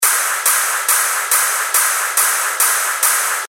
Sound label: 140 BPM, techno loops; Bacchanal; Revealed Techno Top Loops Vol. 9